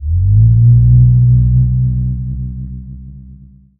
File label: tele get, poltergeist; Soundscape Overhaul; monsters